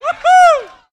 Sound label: "woo hoo"; Voice; ooh ahh